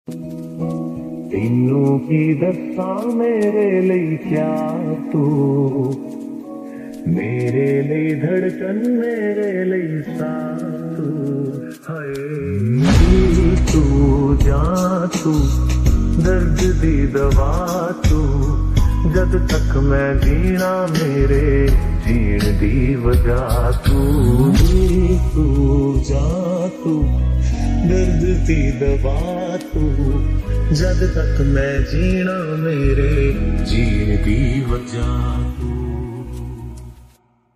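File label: Punjabi ringtone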